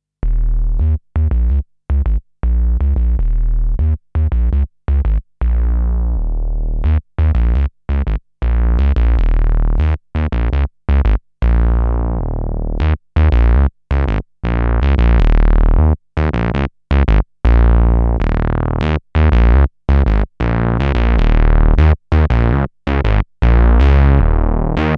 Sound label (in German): Bass Überblendung 1 bis 3 Osc Feedback